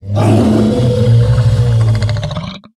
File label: Thickhide Kodo Death